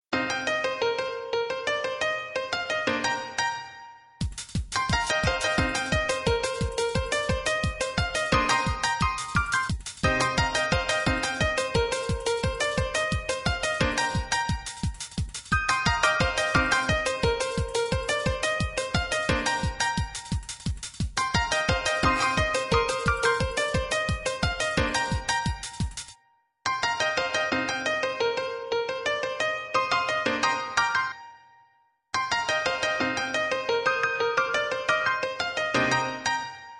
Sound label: rhythm